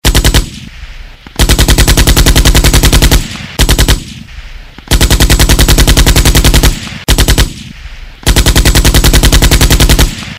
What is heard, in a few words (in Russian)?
Звуки выстрела очередью из пулемета